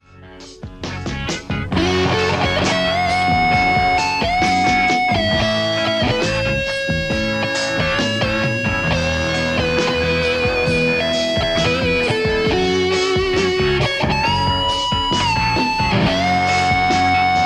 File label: strat; kuassa creme